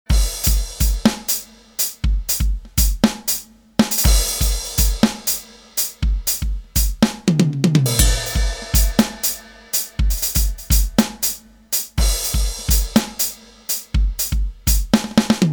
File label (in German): Behringer XD8USB 808 Reverb Effekt